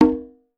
quinto, congas; Voice Crystal - Steve Reid Definitive Percussion

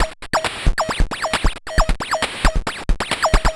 Drums Strange

